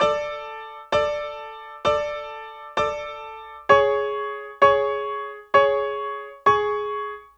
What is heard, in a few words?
KEYB, Hip-Hop & R&B; USB Soundscan vol.47; AKAI